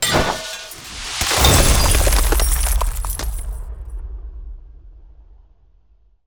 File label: Ice Spell Damage Direct Frozen Dagger; Arctic Aura